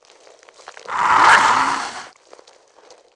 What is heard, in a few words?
Mutant Idle; Valleakan Mutant 1; Aliens